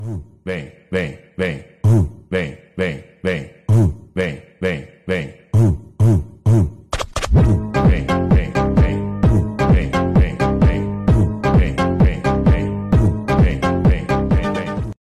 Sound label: mobile ringtones